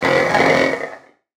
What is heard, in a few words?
damage, fractal guardian; Legacy